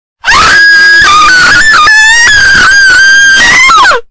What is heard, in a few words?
long scream